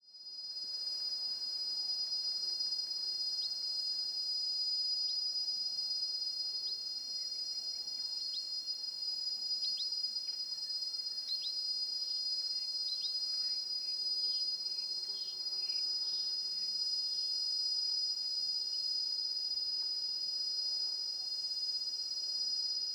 Forest in mountain quiet insects very high and flyers, Ethiopia; Charlie Atanasyan